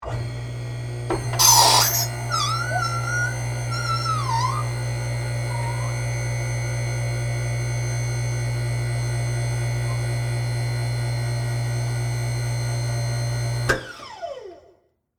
Genie Lift Up, Hydraulic; Machinery; BlastWave

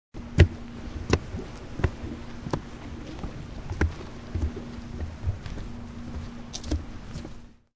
Boots Wood, Footsteps on wooden deck or dock.; Human

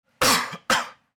Coughing

